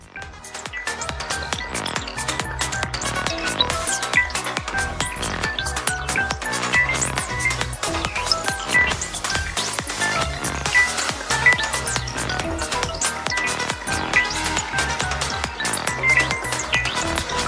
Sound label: Vivo Ringtone